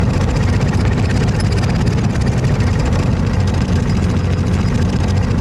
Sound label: tank engine medium loop; Hearts of Iron IV Death or Dishonor